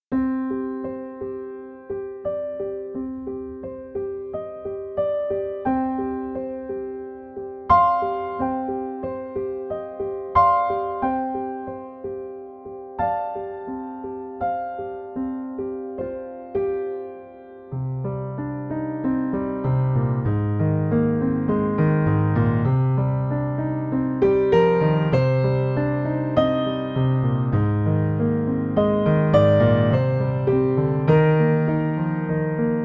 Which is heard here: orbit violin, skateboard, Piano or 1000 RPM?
Piano